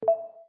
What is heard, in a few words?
Click